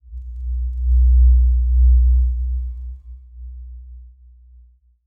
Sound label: Transition Sweep Drone; Production Element